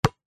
sport basket ball hard bounce x1 on asphalt concrete